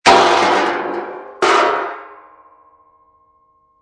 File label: Metal Crash On Cement Heavy, Impact, Metal Crash On Cement, Large Heavy Metal Object Thrown Onto Cement Surface